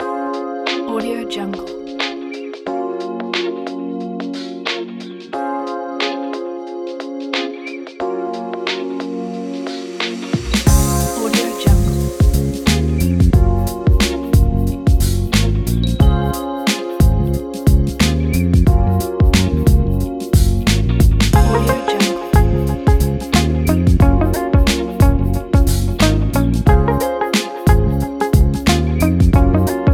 rnb soulful

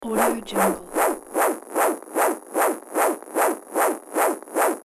retro video game ceiling fan slow

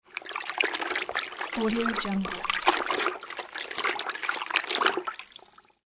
washing laundry in water

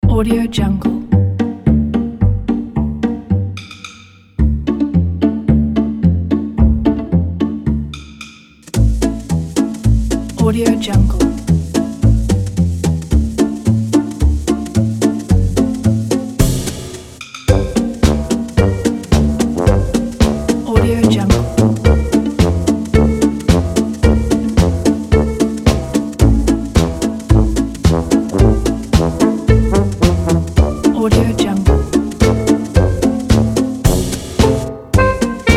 sensual comedy

